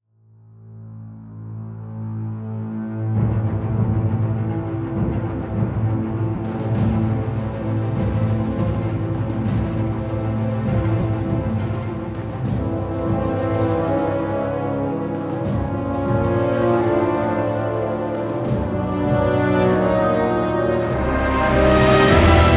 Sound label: Orchestral Essentials; CineSymphony LITE; recorded at the SONY Pictures Scoring Stage; Cinesamples